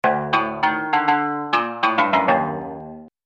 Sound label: kanun